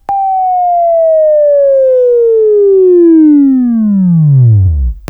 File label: Buzz